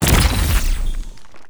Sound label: punch